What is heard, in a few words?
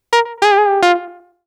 110 A Love Bend